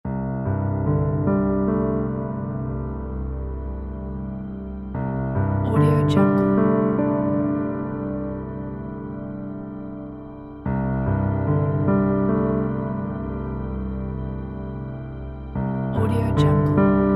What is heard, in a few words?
dreamy